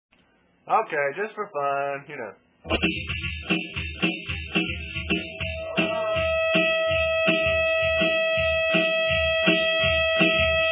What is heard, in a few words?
Drums; DrumsHack